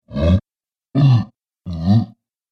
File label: Giants Ogres, Monster Sound Effects